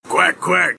quack quack; cowboy

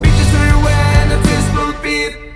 upper button

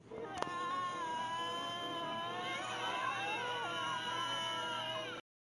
crying

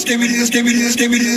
vocal loop; skibidi toilet

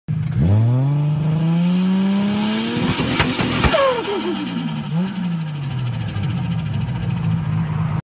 turbo flutter sound; r34

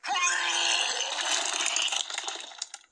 vomiting sfx

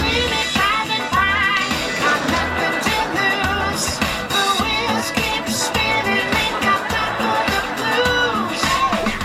cool disco music

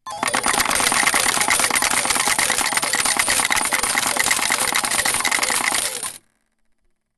jackpot

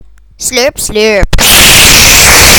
slurp slurp

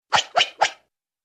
three quick swishes

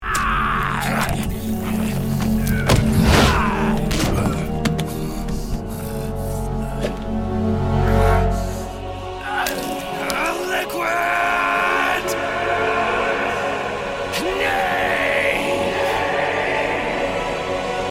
chant; snake vs liquid

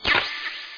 pick up item; mgs

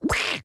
enemy duck notice

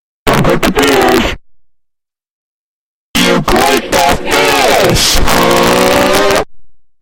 scary; dont click the fish

